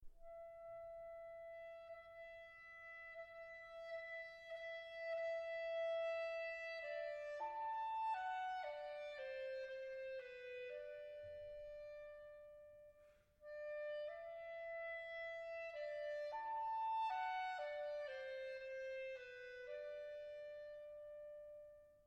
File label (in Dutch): klarinet